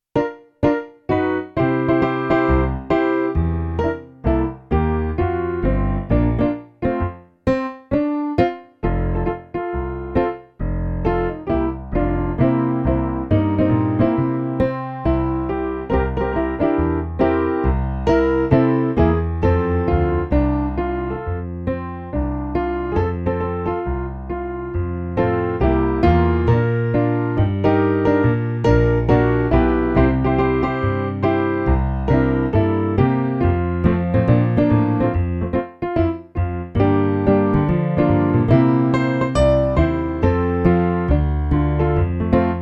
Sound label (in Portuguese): playback hino